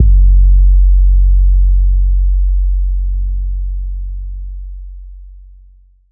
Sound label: trap bass; 7rystan Trap Bass